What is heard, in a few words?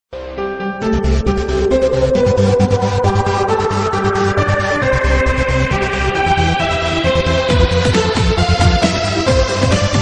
Techno Birthday Ringtone